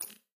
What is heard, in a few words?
button Hover; joker pro